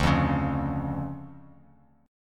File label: D Minor Major 7th D down; DmM7 strummed; JGuitar